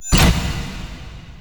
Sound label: Stairs; Warframe; Entrati; Vaults